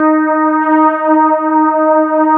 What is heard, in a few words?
electric pianos; Wizoo Electric Pianos